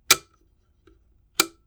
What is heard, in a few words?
Model D - Switch, keyboard